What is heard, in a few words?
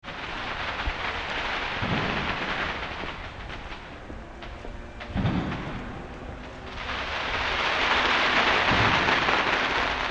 fireworks